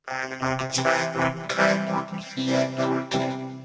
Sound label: vocoder